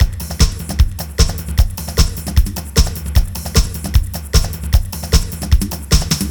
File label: house, loops 140 bpm; NICE HOUSE; Masterbits - Tekkno Trax